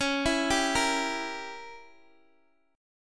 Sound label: C sharp Diminished 7th C sharp, Guitar Open D; down; chord shape